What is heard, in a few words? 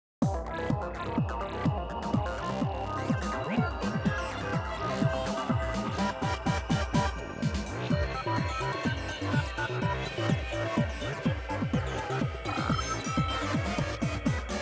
BGM BTL Reverse; PAPER MARIO Color Splash; wiiu